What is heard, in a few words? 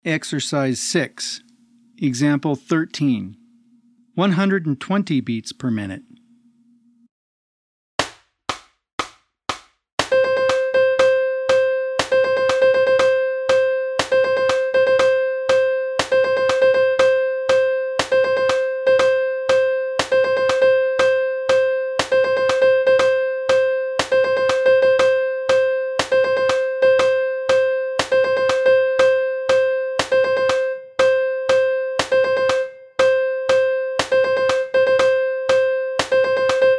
120 BPM